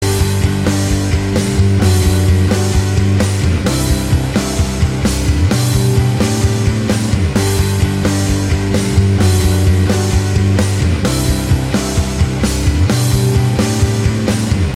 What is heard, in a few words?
guitar rocking